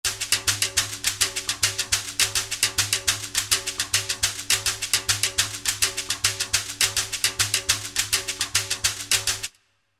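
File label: Shaker Tambourine, Latin; World; Shk-Tmbl; Roland SR-JV80-18 World Collection Latin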